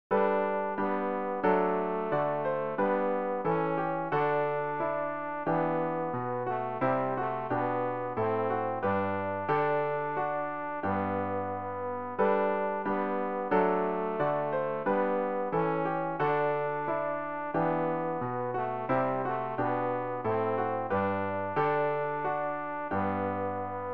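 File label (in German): tenor, Übehilfen für das Erlernen von Liedern; rg 159 liebster jesu wir sind hier dich und dein wort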